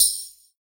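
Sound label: jungle tambourine; Drums In Your FACE!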